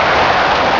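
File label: Skid Dirt; Test Drive Off-Road 3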